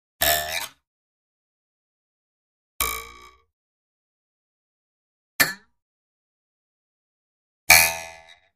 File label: Twangs Wood Up Woode, boings twangs snaps